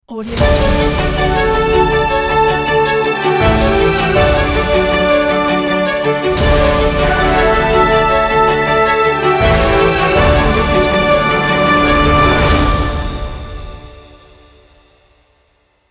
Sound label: winner award logo